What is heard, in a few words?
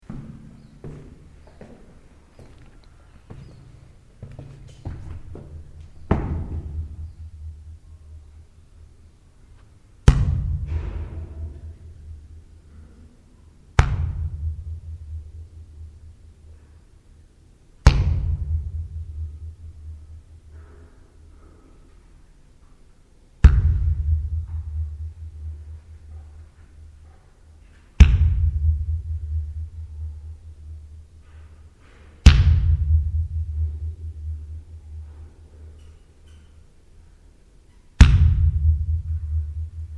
hall of echoes